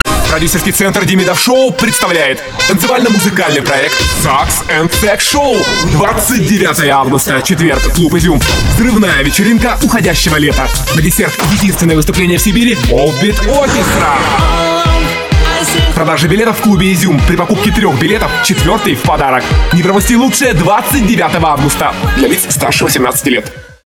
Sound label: Sax